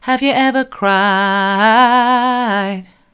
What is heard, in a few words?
have you ever cried mid, vocal